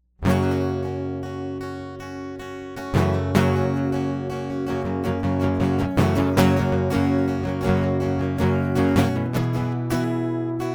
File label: acoustic